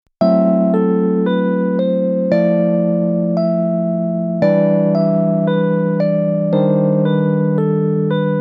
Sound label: modal interchange lydian